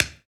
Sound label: TAP, Perc Toys; Spectrasonics; Supreme Beats; World Dance